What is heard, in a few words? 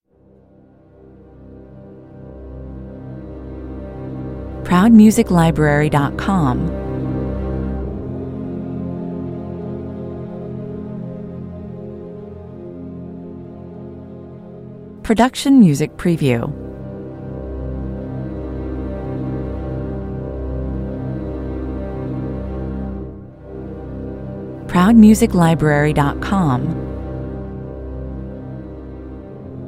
strings only